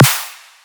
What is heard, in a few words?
small dubstep snare